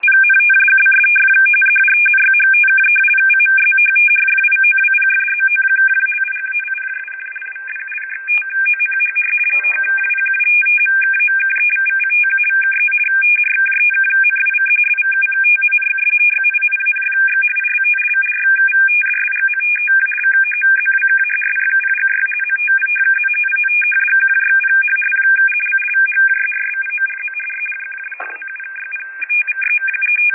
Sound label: RTTY